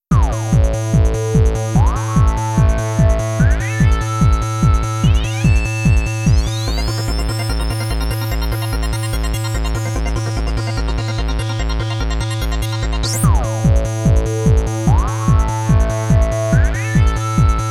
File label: Movie BGM, Techno; Mario Artist Talent Studio